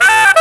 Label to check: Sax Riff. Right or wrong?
Right